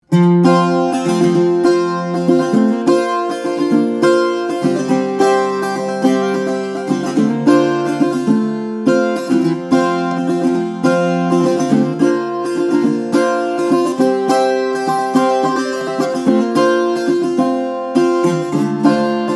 steel-string guitar, flattop; emerald amicus12 opus red

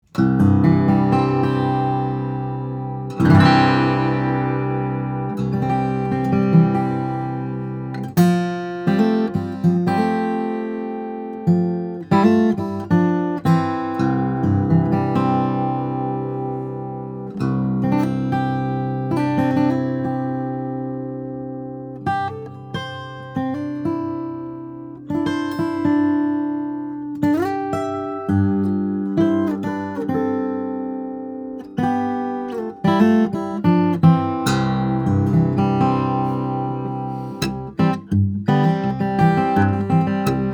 Fret; 000-12; brazilian rosewood; red spruce; flattop; M.J. Franks

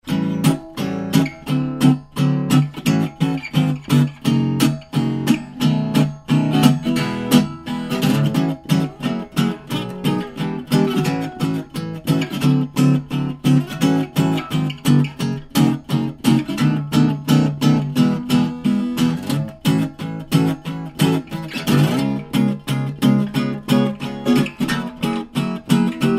gibson 1934 l5 reissue

